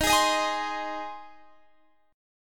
C Minor Sharp 5th, D sharp, chord

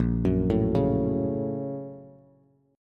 A sharp Minor Major 7th Sharp 5th, Bass

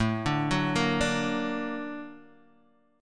Bb Augmented Major 7th A

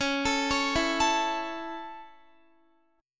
C sharp Minor Sharp 5th down Guitar; C#m#5; arpeggiated

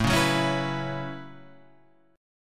A 6th Add 9th A down Guitar Standard; A6add9; strummed; JGuitar

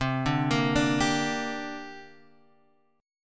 C 7th Suspended 2nd, Guitar, Open G

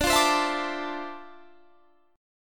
D Minor 9th, down

